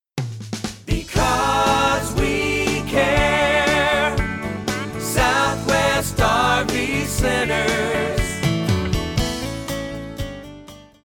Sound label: Jingle; SWRV Centers